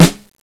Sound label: SNARE, DRUMS; FAIRLIGHT CMI IIX